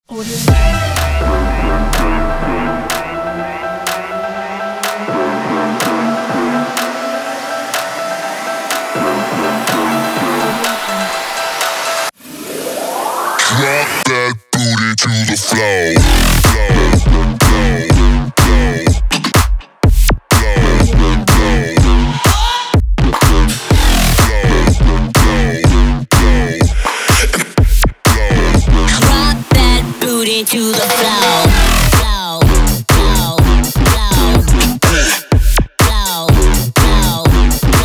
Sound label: sport bass house; colourfulsounds